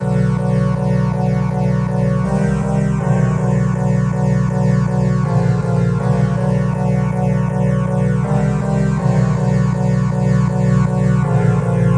160 whuppy-whup-moody-synth, synth and electronic loops